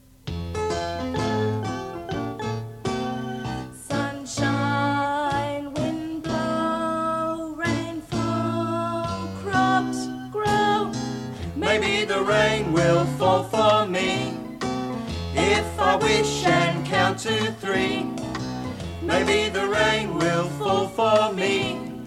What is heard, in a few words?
Wind and Rain Song, Vocal; The Great Big Enormous Turnip